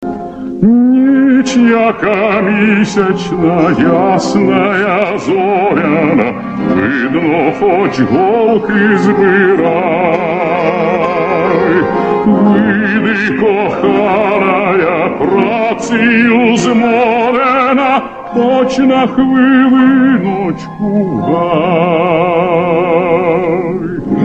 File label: ukrainian song